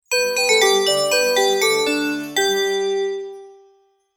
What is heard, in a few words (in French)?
Melodie Carillon rapide